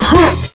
jump; vortex; velvetjackson

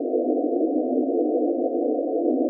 atmos

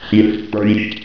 gurp

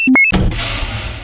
keyuse; baseq2

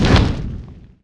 large fire impact

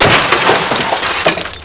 brk glas

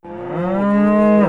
moo; openffa; aggrocult

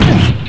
bump; openffa; TERMINATOR

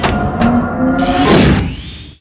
switch; zaero; world

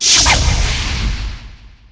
portal cast

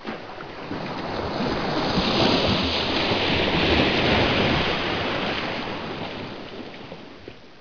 shore

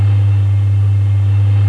amb pipe

